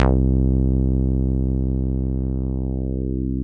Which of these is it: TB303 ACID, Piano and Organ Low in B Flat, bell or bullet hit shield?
TB303 ACID